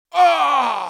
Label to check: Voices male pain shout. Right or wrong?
Right